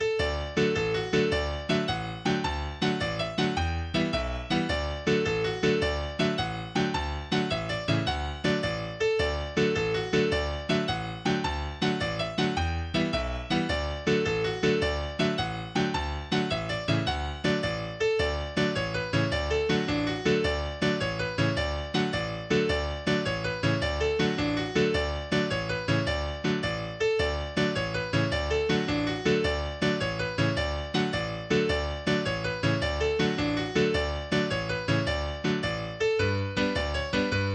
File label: Weymouth Quickstep, english dance tunes; eighty english dance tunes